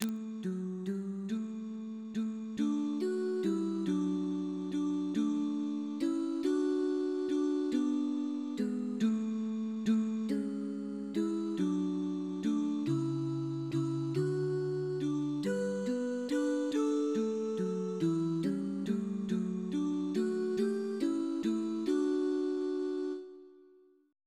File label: canon